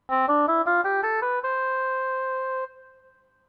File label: oboe short